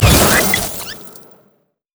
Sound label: upgrade; LoL; heimerdinger